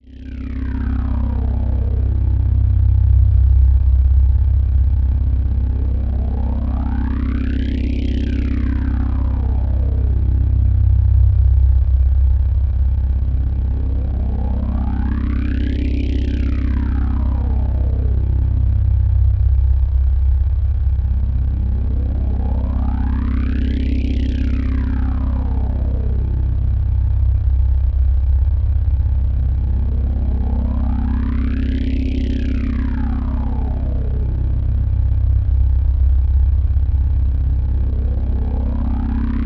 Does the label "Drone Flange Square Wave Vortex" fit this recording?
Yes